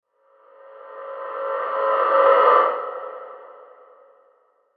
CRESCENDO WHOO, Crescendo Whoosh Reverb Ghostly; Production Element Imaging Element Accent Transition

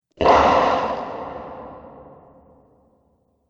Fire Breathing Monster; Monster Sound Effects

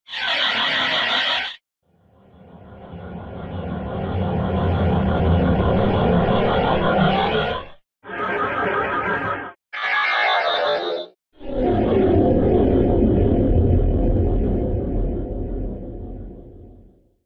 5 Whooshes; Pack 2

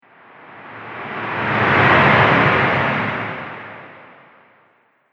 Reverb Whoosh Strong; sound design

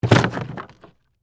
Impact Wood Plywood, Drop, On, Plywood; Wood (Sound Effects:Materials); BlastWave

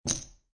Poker Chips Two Chips Dropping On Felt; Gambling

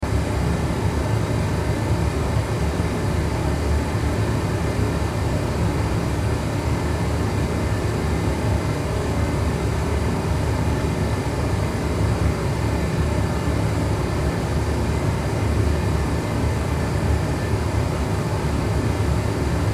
Furnace Room, Furnace Room Loop Ambience; Furnaces (Sound Effects:Household); Blastwave

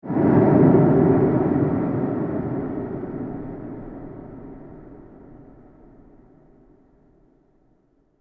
Prehistoric Dinosaur Ambience; Dinosaur Sound Effects; fantasy sound effect

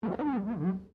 GLASS SQUEAK FINGER

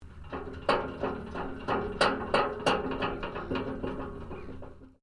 MECHANICAL SUSPENSION METAL SPRING WOBBLE SHORT; Variou smechanical sounds; Objects

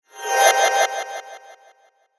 Whoosh Delay Sparkle; Sparkle Sound Effects; Part of Sparkles; Blastwave

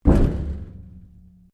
Strike Metal Tank Exterior

